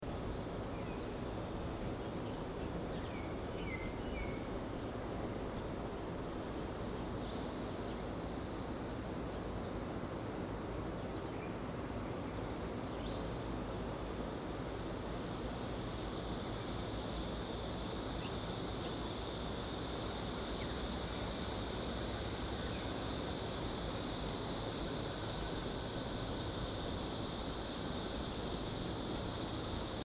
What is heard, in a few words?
Empty Hualien Baseball Stadium With Loud Air Conditioner On the Left and Some in t; 花蓮縣立德興棒球場; 位於美崙溪旁的花蓮縣立德興棒球場; 花蓮縣花蓮市